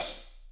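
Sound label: HH, hi-hat, Real-Kit; 90 s Sample CDs; Sound & Vision - Gigapack I CD 1 (Roland)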